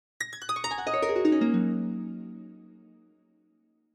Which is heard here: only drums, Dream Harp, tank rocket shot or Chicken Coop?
Dream Harp